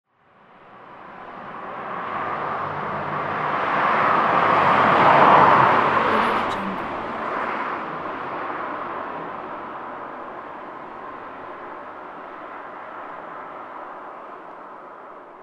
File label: car passing